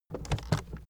aluminium case steps

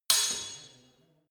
sword hit fast sword single

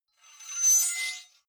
sword blade screech high

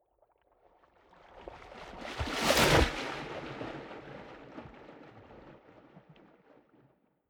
waves splash long

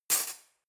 cutlery knife drawer place hard

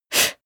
female breath fast in

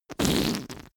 whoopee cushion fart juicy

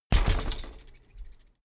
bicycle bike land rattle front

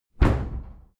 cupboard close hard

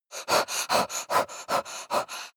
breath female pant

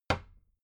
cabinet door close regular

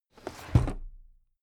drawer cabinet stuff close heavy

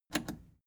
plastic button scratch smooth click enable fast

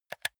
console controller r1 press fast hard

